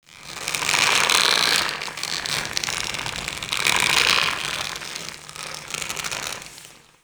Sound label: Infested Tendon Stretch; Warframe; Corpus Infested; Ambience